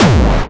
Distortion Kicks, Bassdrums

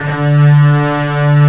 BASS G1, VIOLINS; AKAI S6000 CD-ROM - Volume 1; Strings

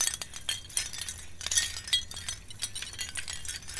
Abstract Twinkle Loop

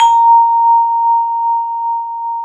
VIBRAPHON; ILIO

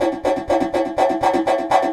Echo Chuff, Synthetic Pulses

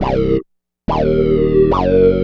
Wow Synth

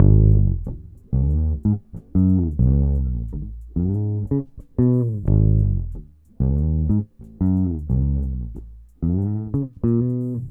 bas G#, Hip Hop & RnB; Best Service ProSamples vol.34; Okey Dokey

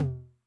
MOD Noise, 909mod MID TOM; classic machines; MT - MID TOM

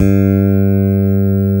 5 STR P BS G3, PICK BASS

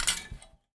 Finger Brittle, Prepared Kit; Battery 3 Sample Kits

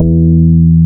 BAS MKS-50; Best Service Gigapack 2

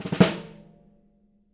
snare ruffs, JZ XSNARES; Sampleheads; Peter Erskine Living Drums

